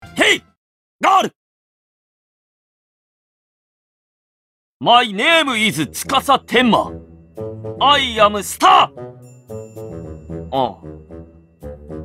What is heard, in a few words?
laughings; tsukasa